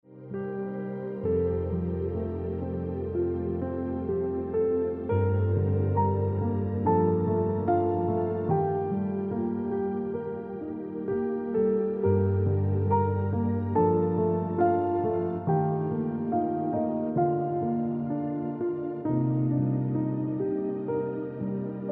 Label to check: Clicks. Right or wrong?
Wrong